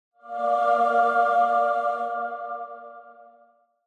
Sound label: Angel Mid